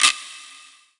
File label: Guiro Short, Percussion; realdrum